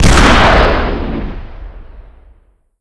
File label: rocket fire